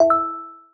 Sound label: alert